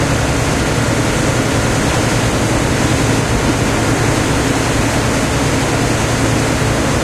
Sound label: su 100 engine int rpm 66, tanks; engines; War Thunder